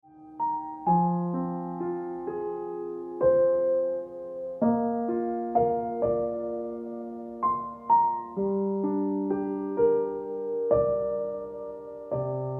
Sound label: Ringtone